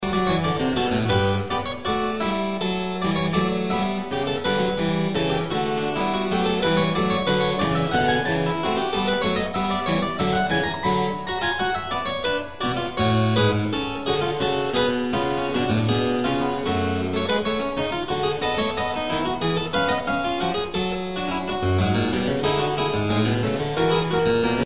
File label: well tempered clavier fugue c minor; bach